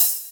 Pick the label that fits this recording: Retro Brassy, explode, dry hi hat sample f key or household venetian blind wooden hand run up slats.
dry hi hat sample f key